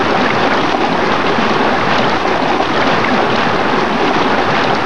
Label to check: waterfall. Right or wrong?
Right